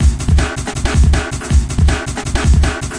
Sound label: 160 dnb beat; drum loops and breaks; 141 to 160 bpm